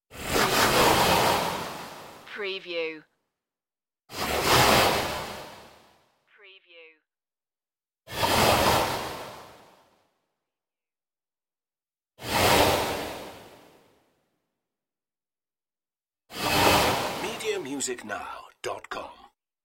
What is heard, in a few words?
hiss burst whooshes